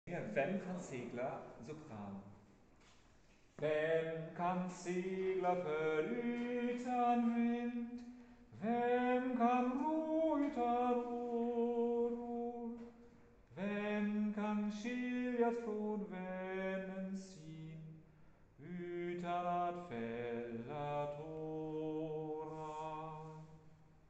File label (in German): Sopran; Vem kan Segla